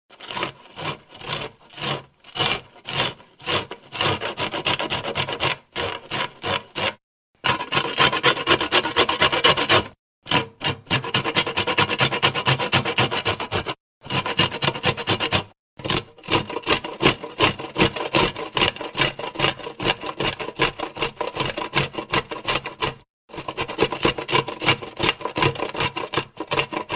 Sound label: Sound of grating on kitchen food grater; Household